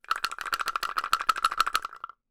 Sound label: kpoko, Kenya Kpoko Kpoko